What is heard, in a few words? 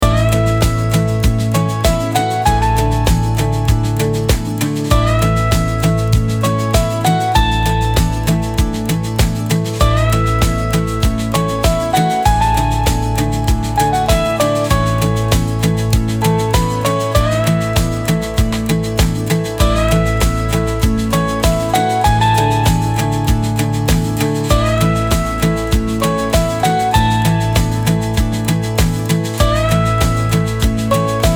music country side landscape